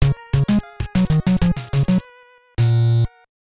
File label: Game Loss; Tecmo Baseball; NES